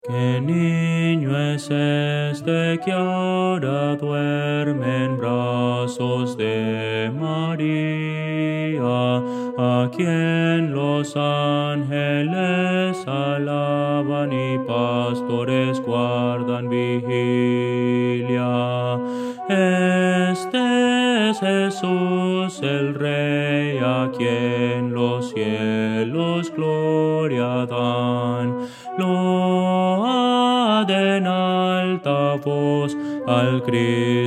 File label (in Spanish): contralto; que nino es este; Voces para coro